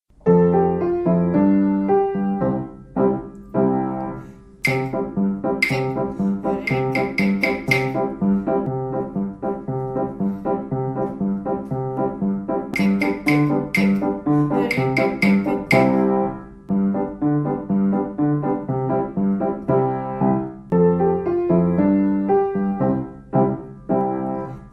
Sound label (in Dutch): ritmisch tussenstuk voor en nadoen